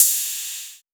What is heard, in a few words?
Ride, Cymbals